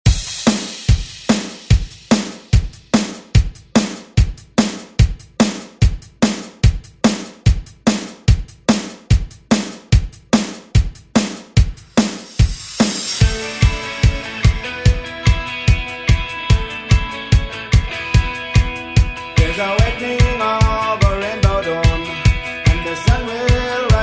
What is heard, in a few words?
90s ReDrum; DJ Mhark